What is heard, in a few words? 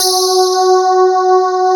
sparkles